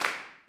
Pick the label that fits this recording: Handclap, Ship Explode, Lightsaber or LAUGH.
Handclap